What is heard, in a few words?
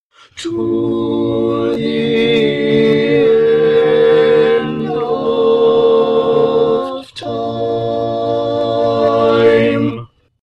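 baritone, barbershop tags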